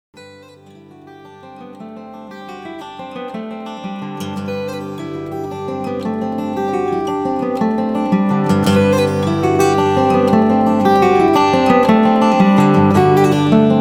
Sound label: Celtic Wedding; bands and ensembles